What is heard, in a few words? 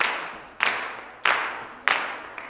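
CLAPS 96, Gospel; Blues & Soul; G.CLAPS 96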